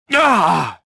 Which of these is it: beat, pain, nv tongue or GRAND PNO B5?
pain